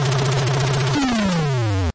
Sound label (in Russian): lava cifrovoi